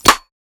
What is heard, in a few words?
rim flam